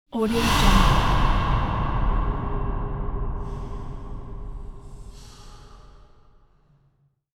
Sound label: startling demon breath growl